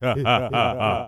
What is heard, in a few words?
laugh